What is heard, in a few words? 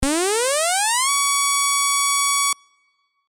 Env VCO